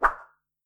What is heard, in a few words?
Sword Swing Strong